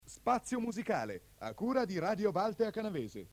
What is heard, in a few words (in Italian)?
Stacco spaziomusicale